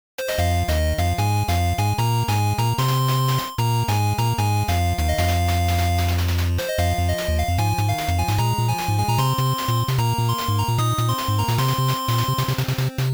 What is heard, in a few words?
Dungeon; ninja smasher